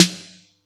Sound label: Yamaha EX-5